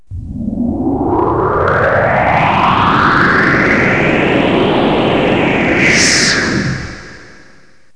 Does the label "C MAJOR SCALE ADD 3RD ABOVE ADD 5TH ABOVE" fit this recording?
No